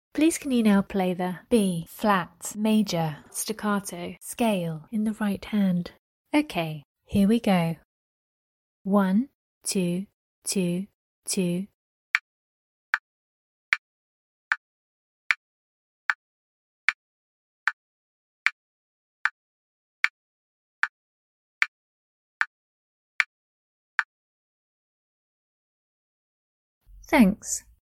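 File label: B flat major staccato scale right hand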